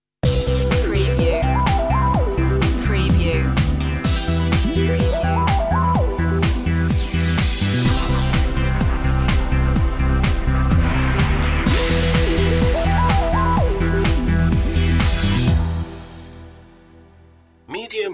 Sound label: cable groove intro